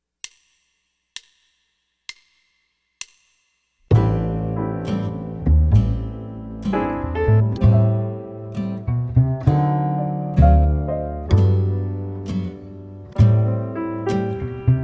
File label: Ballad No Drums; All-State